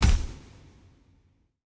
Special Click; Folk Hero

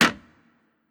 Boat Man Wood; Legend of Keepers (gamerip) (2019)

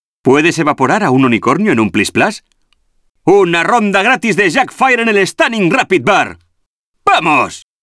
Bonus; arthur and the revenge of maltazard; wii

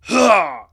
Grunt; Nailmaster

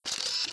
Shutter; Touhou Jitsuzaisou Dream Logical World

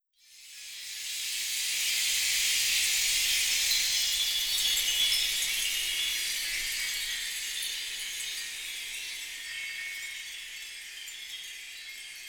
Chimes

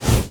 Mantis Lord Down Slash

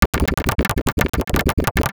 Airplane Win; America Oudan Ultra Quiz

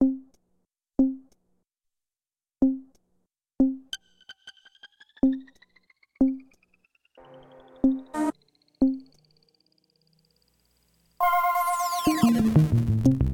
Fight Intro 92 Bpm

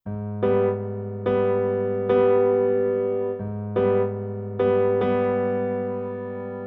Loop; The Act; Arcade, iOS, MacOS, Mobile

